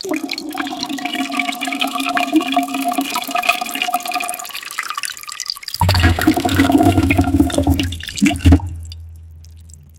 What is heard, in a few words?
drain sound effect, draining